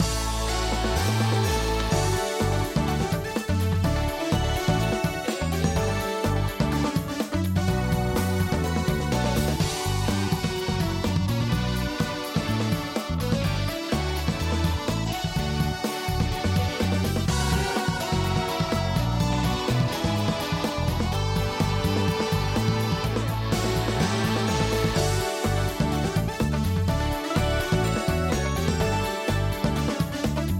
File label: BGM; Brazil; baseball